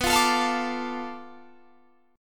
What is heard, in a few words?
D Minor, B; Dm/B strummed